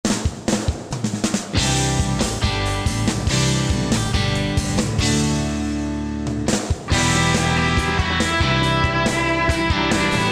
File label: hard rock